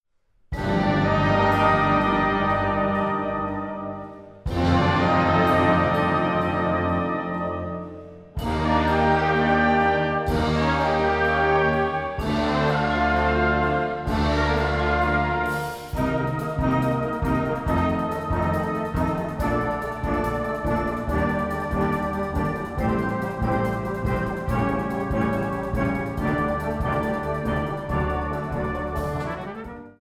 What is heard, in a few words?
Brass Band